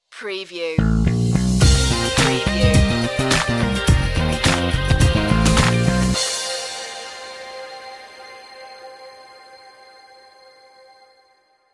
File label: funky stinger